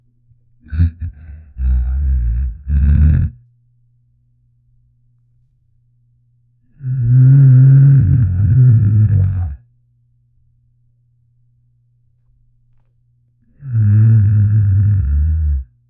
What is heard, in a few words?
loud bloated stomach gurg